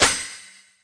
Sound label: CRASH Small, Roland TD 7; drum machines